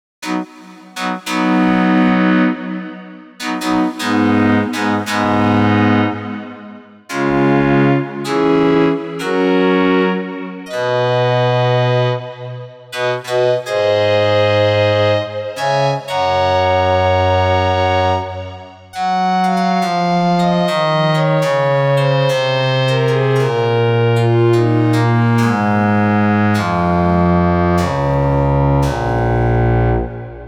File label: pluck and bow lead; the digital life